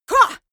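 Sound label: female combat attack short, screams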